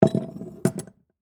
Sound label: Skeleton Key Down, Set; Foley; Jangling Sound Effects; Objects; SoundEffects-BlastWave